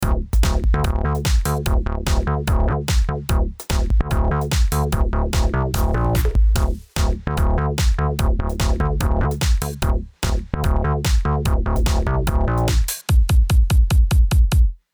Tierra Audio Gravity VCA groove atk fast rel

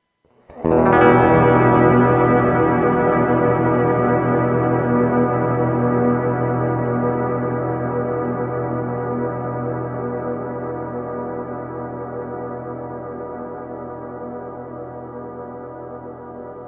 Sustain

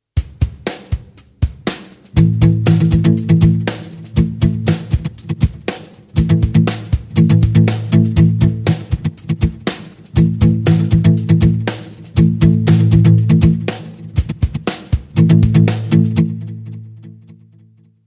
mutes

